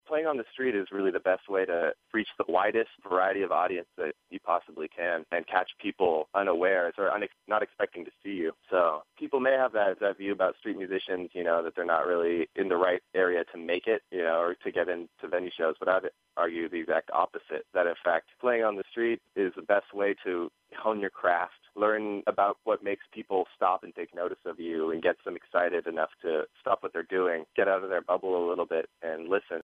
Piano

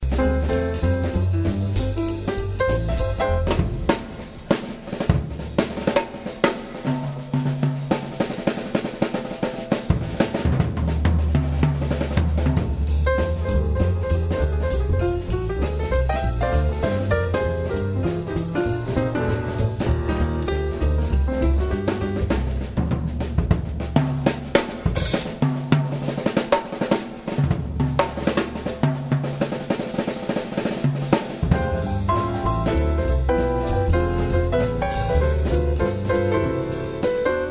jazz

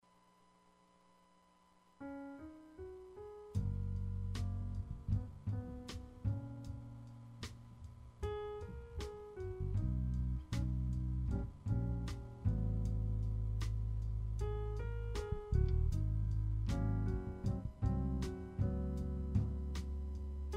jazz

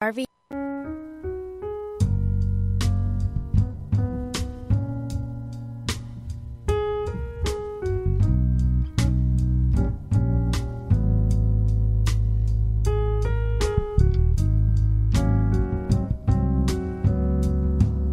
jazz

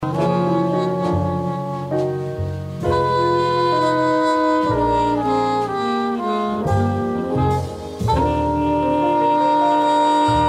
jazz

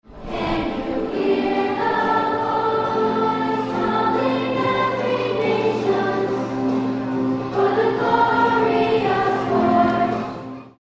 Recycling Singing

